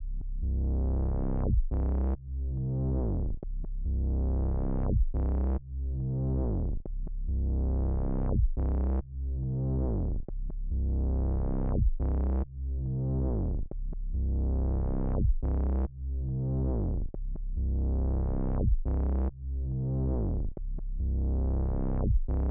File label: 140 BPM C Min Bass; Trap Melody Loops; Loop Stems; Cymatics; Nightmares; Spaced Out